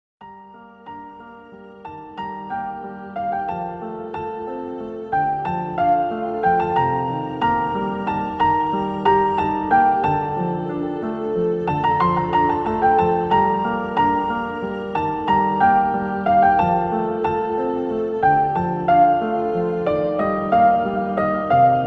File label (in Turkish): Enstrümantal fon müzik Hüzün Piano; Sen Anlat Karadeniz